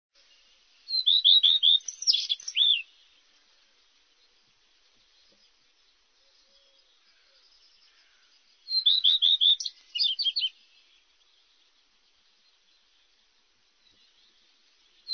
rouge queue a front blanc

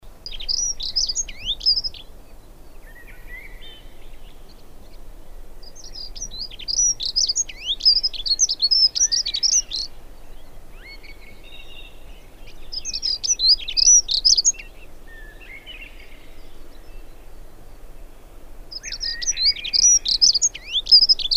accenteur mouchet

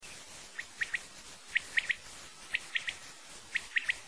caille des blés

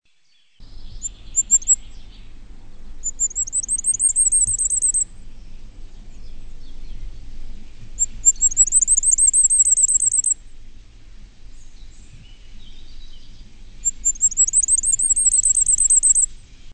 roitelet triple bandeau

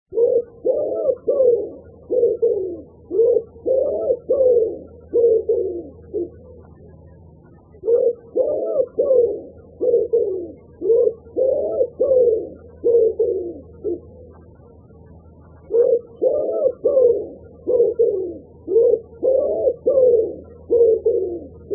pigeon ramier

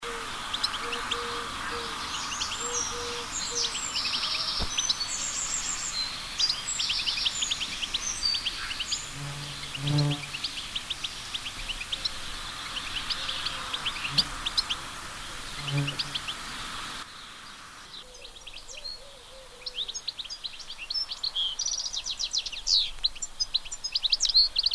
chardonneret

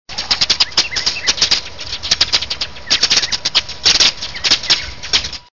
oiseaux en foret

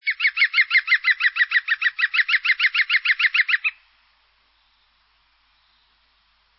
pic vert

